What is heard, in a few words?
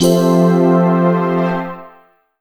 PAD, Drum & Bass, 160 BPM